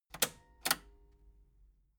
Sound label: metal button cassette stop slow